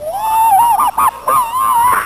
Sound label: scream reverse